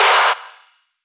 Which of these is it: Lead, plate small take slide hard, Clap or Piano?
Clap